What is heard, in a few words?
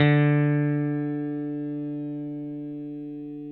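6 string bass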